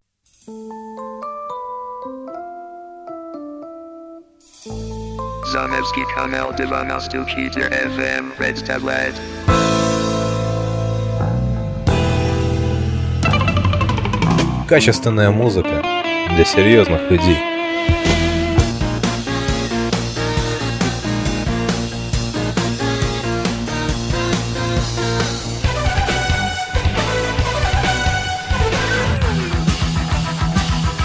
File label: jingle